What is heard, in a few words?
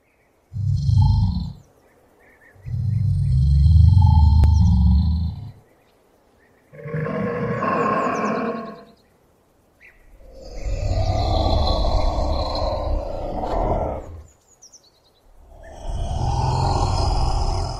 Komodo Dragon